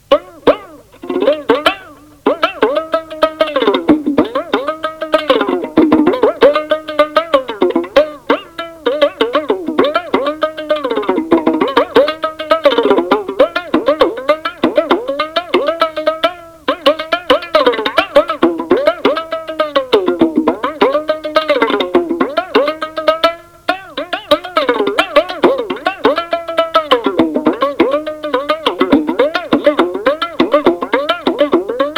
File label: Khamak solo; BANGLADESH